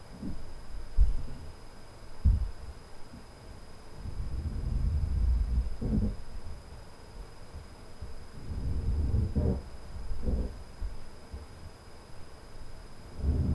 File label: Drill